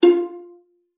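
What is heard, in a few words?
violin pizzicato F4; Xsample VOL-5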